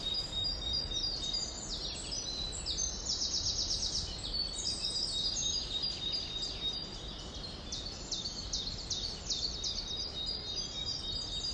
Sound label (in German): Vogelgezwitscher